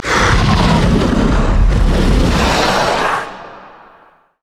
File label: spider idle; LoL